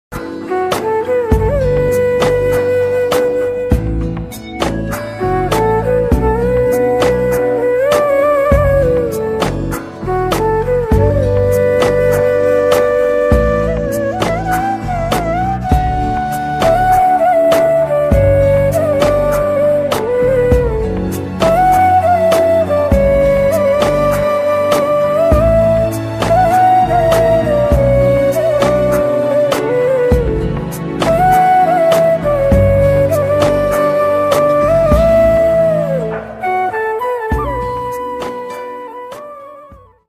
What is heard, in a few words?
flute ringtone, romantic ringtone